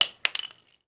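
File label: shell bounce large, weapons, shells; ModernWarfare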